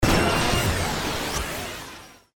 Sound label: Ascend Burst